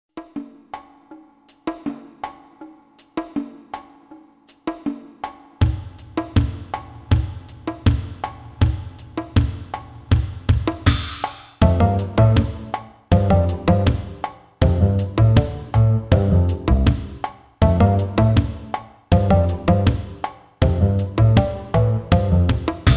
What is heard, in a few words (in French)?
VERSION KARAOKE; Alex et Zoé 3